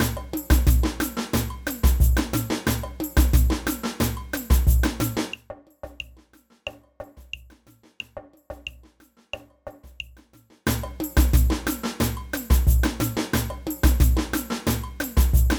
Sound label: cuica, samba